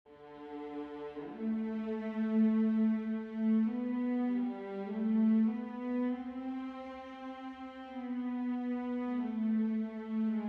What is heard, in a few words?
fugue, string quartet